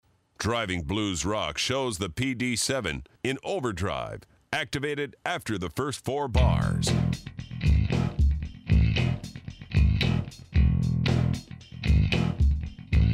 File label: PD7 Driving Blues Rock